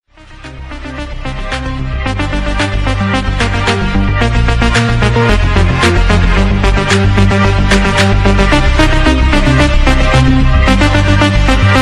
Azerbaycan Dombra

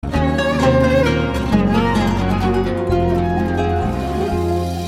Sabah mesaj sesi

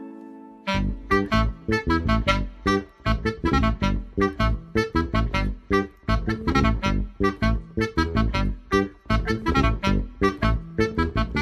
Klarnet

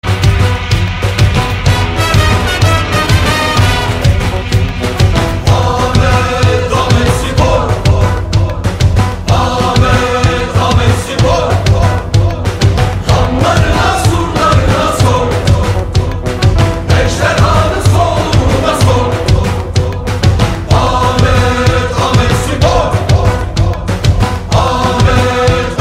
Marsi; Amedspor

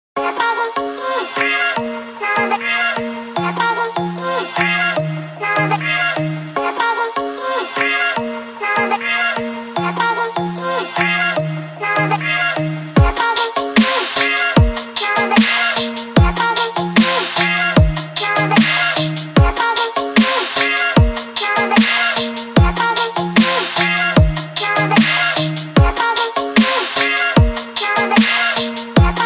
Dubstep zil sesi

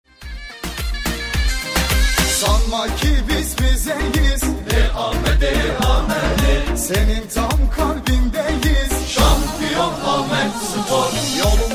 Marsi

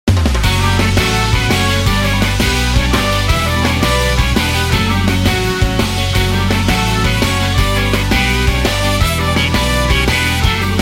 Futbol Muzigi; Ulker

